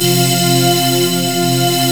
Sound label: PADY CHORD; B.BEAT